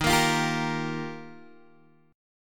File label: Eb Major Add 9th, Guitar Open G